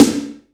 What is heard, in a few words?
SN, DANCE